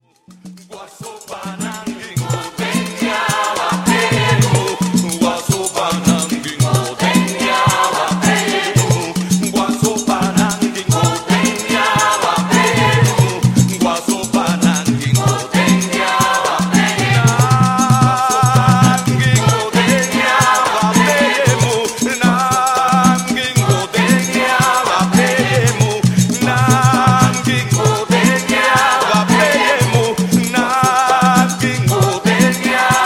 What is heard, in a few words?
Merengue